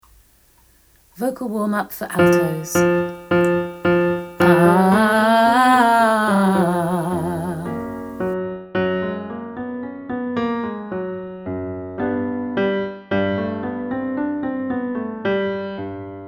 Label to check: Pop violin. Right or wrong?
Wrong